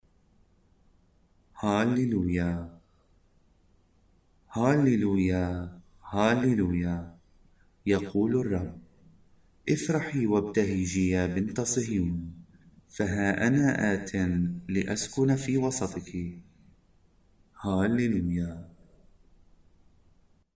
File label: Halleluiah, arabic mass